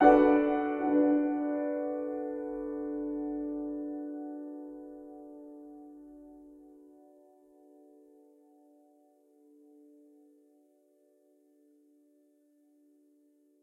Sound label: Piano